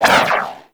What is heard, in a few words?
ring bounce; Universe Ring; Warframe; Nezha